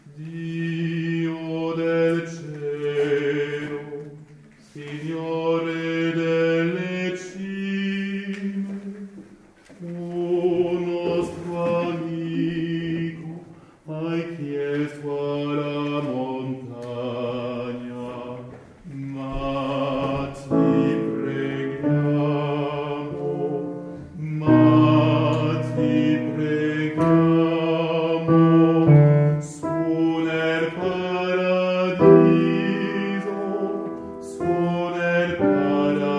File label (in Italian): Signore delle Cime Alti